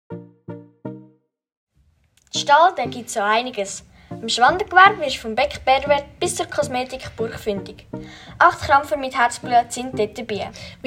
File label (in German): Jingle; Beck Berwert; Kosmetik Burch